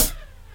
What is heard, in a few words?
Hit, Percussionz